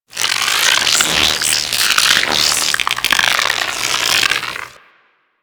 Shock Swarm Projectile Random; Warframe; Infested; Shock Swarm Rifle

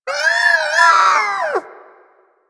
Sea Bird Tranquillized; Warframe; Hunting Critters; Ostron Sea Bird

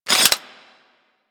Blade Close, Weapons; Mios

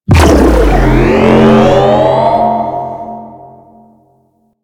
Energy Gate Start; Ambience; Warframe; Infested; Golem Trial

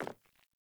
Sandals Land Default, Footsteps; Characters; Player

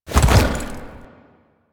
Horse Jump Heavy; Foley Actions; Warframe; Vehicles; Duviri

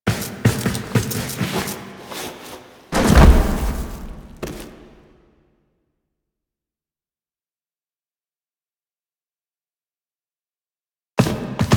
Foley; Tenno; Warframe; The New War; Fight Intro

